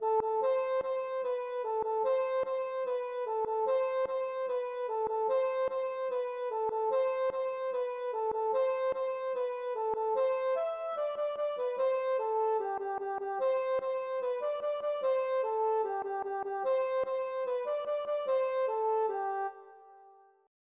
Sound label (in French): paludier rond